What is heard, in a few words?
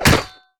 enemy razing Rhino basic Attack